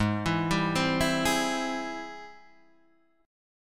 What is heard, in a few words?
G sharp Augmented Major 7th G sharp, guitar; G#+M7 Chord {4 7 5 5 5 4} arpeggiated